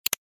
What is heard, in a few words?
ui change